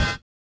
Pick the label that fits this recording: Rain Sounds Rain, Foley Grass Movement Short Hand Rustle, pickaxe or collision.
collision